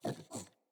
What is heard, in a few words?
Puppy Idle Snort; Enemies; Vocals; Kubrow